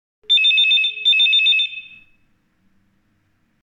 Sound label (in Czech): Pridavny zvonek k telefonu